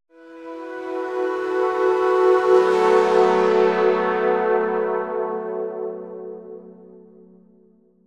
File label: Synth B Long